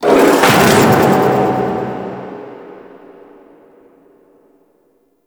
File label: Distant Gates, Fortress Ambience; Warframe; Grineer